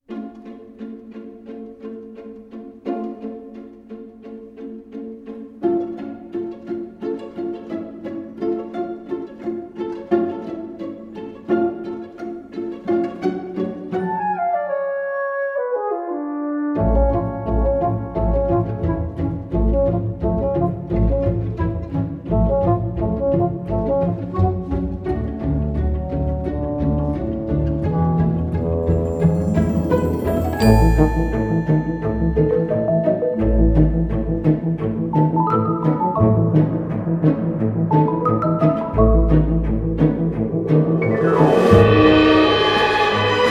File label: Orch